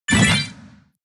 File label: jump; Blue slush cookie; cookie run